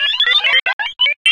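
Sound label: sphere blips large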